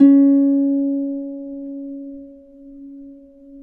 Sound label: DB3 HARP; Complete Harps; USB Soundscan vol.62; AKAI